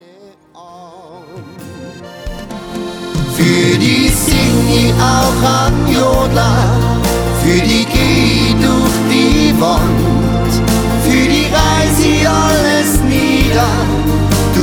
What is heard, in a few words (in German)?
Fuer di sing i an Jodler